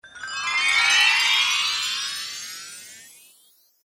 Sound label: Santa Xmas Spell, Magic Sound Effects